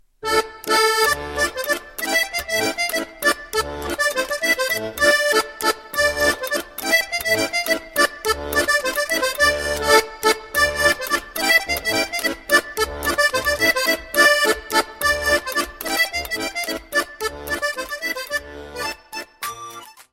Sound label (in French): Polka, France